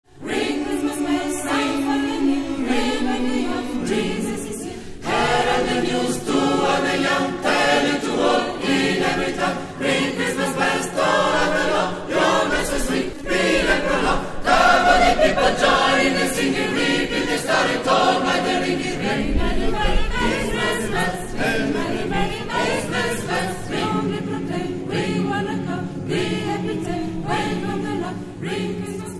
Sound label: Ring Christmas Bells; coro; repertorio coro tre ponti